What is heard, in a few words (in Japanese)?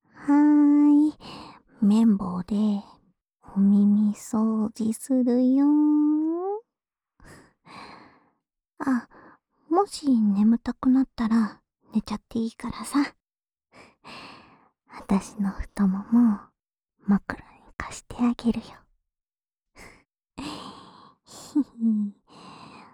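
綿棒耳かき（左）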